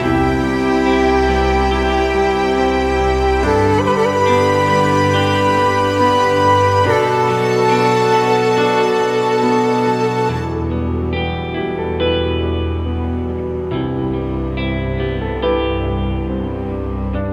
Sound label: dramatic guitar comp hasret; by burak sound bank; dramatic dram vol 14